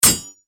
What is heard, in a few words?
Sword clash impact metal clang